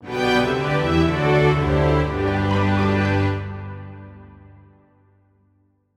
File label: News Jingle